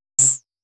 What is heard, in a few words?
BLIP